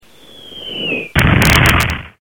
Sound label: bomb drop; Mono